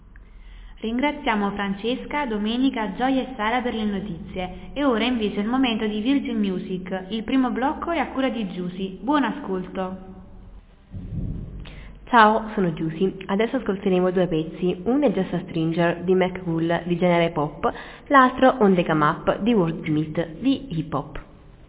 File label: music